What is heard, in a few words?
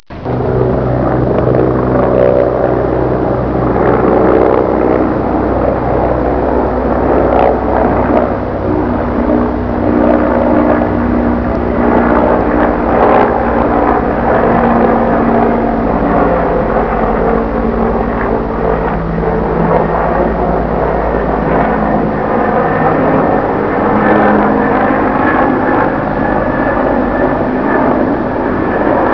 HELICOPT